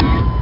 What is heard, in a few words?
clang